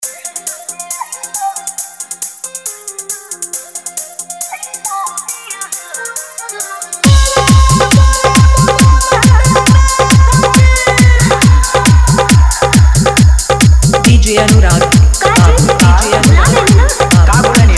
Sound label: Hard Dhollki Mix